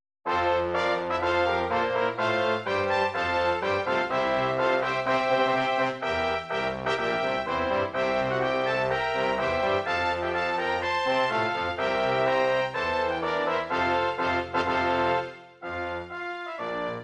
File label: anthems united arab emirates